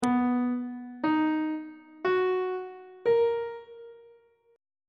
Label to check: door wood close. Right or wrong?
Wrong